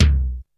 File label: Short Reverb Kick Sample C Key, Royality free kick single shot tuned to the C note